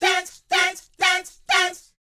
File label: LET, Vocal, R&B Dance, DANCE 120